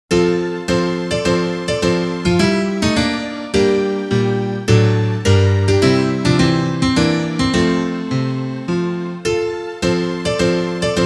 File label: instrumental